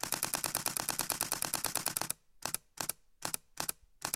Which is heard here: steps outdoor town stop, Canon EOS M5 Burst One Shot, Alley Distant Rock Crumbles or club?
Canon EOS M5 Burst One Shot